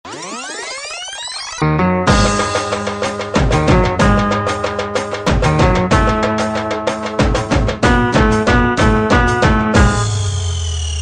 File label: Music; Rowdy Rathore